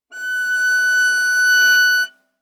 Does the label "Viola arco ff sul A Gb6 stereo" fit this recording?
Yes